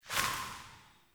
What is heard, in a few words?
Sparrow Death State One Wing Flaps; Animals